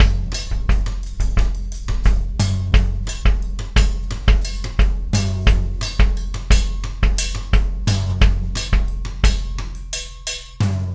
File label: DRUM; DRUM SUGAR